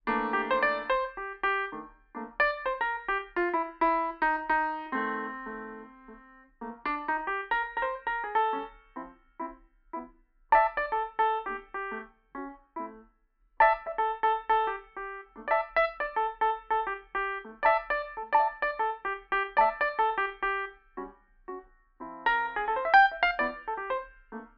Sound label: piano; MIT 21M.380 F08